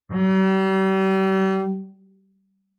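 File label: Bass arco fortissimo sul G G3, Double Bass; MIS Pitches - 2014; Strings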